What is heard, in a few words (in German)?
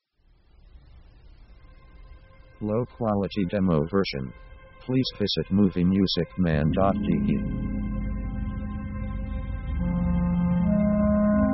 suizid, dark mood